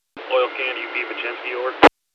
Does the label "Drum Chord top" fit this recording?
No